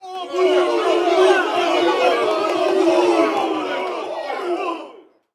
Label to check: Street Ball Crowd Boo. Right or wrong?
Right